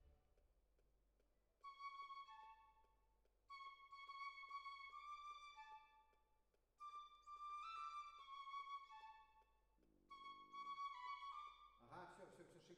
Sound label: Violin Solo